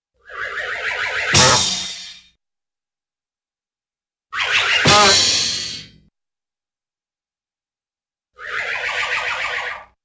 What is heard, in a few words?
Twirl & Fall, Cartoon; Hollywood Edge Cartoon Trax